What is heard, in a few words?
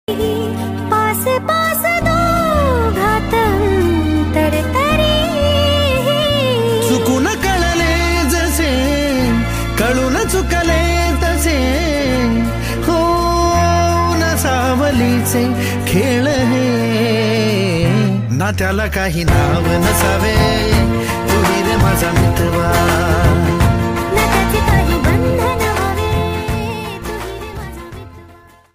Marathi Ringtone